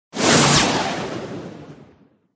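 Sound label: manticore buff; mantikora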